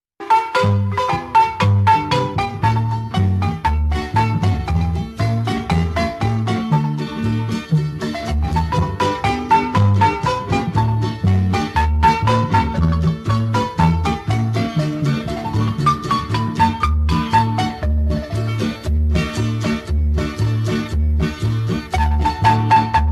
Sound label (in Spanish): Marimba; NICARAGUA